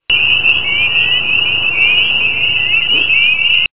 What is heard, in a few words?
peeper call, frog